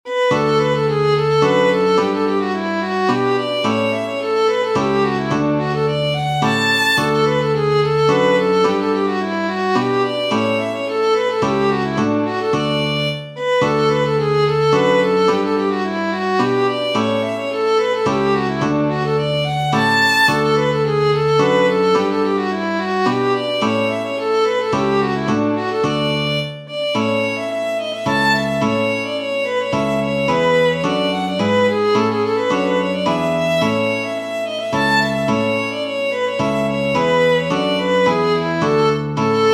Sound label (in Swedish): Tonartsfria Polskan